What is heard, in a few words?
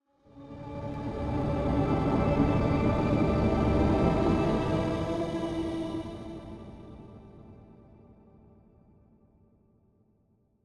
prisoner elegy beat; OuterWildsModManager; OWML; AnonymousStrangerOW.TheStrangerTheyAre